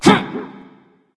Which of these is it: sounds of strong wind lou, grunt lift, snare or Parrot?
grunt lift